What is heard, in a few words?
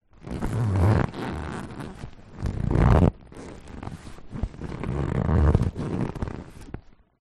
leisure lilo or rubber ring inflated body movements squeaks creaks; zapsplat